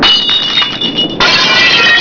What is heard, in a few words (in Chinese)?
玻璃; 日常生活; 特酷声音素材库